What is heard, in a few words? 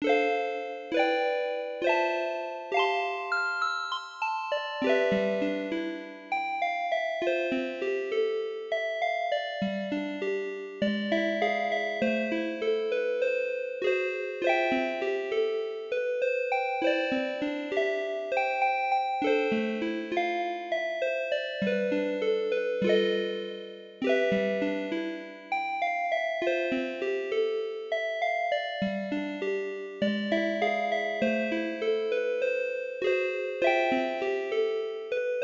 Music box Ver